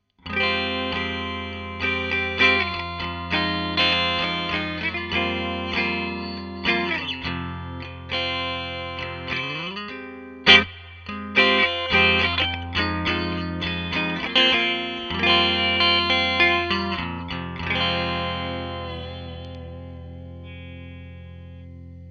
UT fet 47 Git Amp Clean

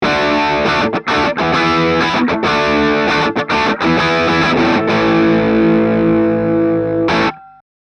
F8 Crunch Git Sm57

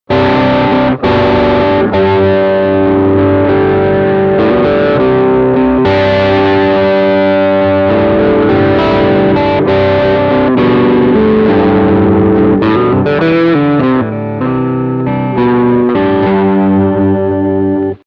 crunch Front Rear

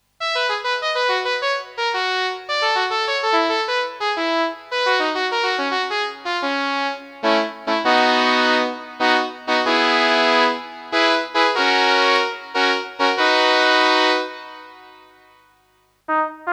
Behringer Solina Trumpet Horn Reverb

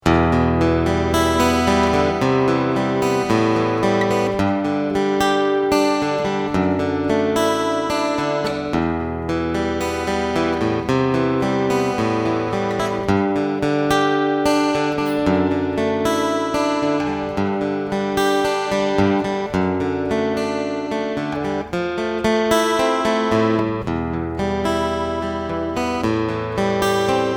ENGL A101 Chorus

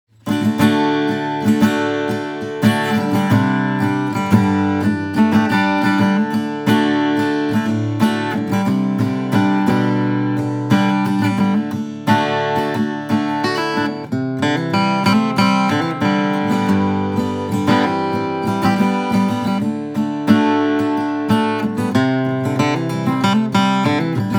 Gretsch G9500; Jim Dandy

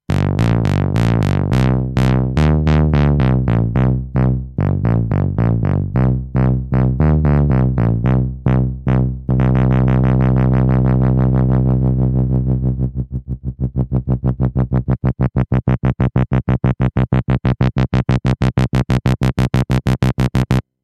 Electric Bass

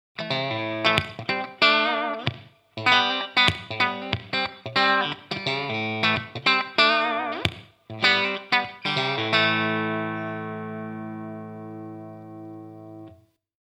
Bridge PU Clean

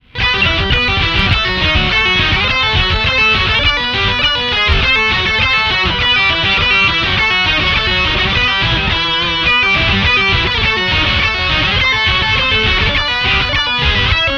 duovoice

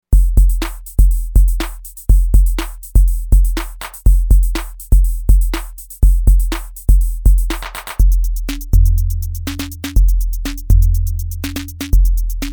Electro Beats; Volca

